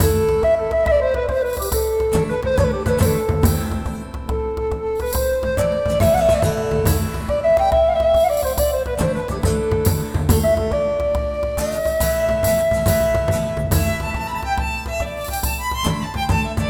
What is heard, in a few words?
Tavern Mini Games Main Loop; Skellige